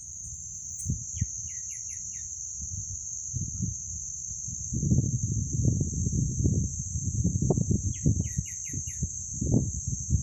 Anambe comun, Pachyramphus polychopterus

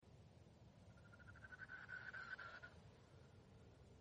Becasina Comun; Punta Lara

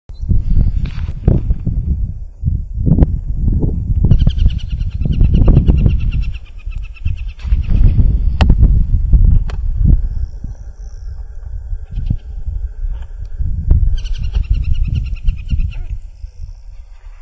Circus cinereus hembra; Bahia de Samborombon

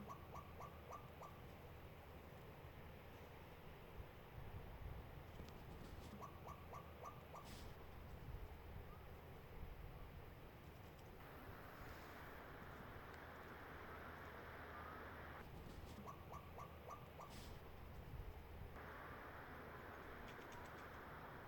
turca

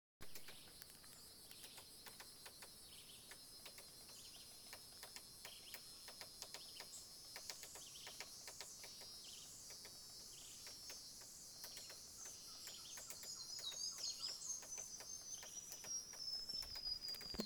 piculet de misiones, Carpinterito Cuello Canela (Picumnus temminckii)

